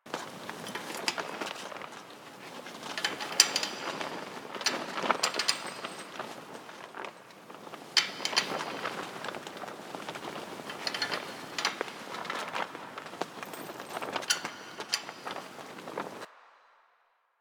Tarp Rustle Loop; Warframe; Ambience; Nineteen Ninety Nine

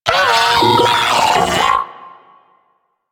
Arachnoid Vocal Death; Warframe; Corpus; Arachnoid Hunger

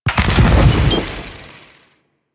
Corpus Gas City Tesla Coil Explosion; Ambience; Warframe; Gameplay Remaster

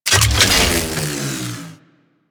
Laser Power Down; Warframe; Ambience; Corpus Gas City; Gameplay Remaster

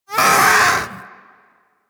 Vocal Pain D; Warframe; Hunting Critters; Vulpaphyla

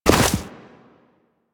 Run Deep Snow, Footsteps; Warframe; Operator

